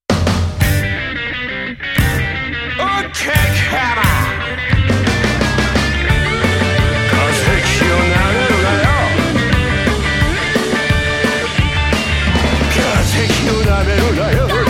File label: rock